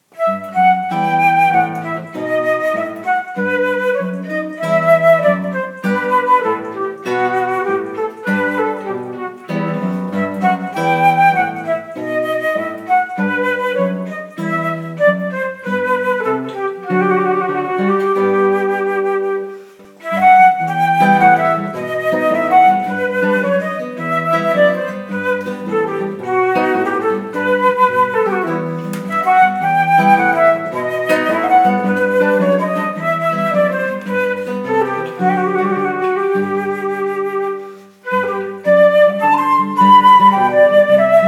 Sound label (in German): Flöte Gitarre; Bach